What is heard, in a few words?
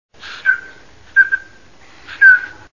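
common scoter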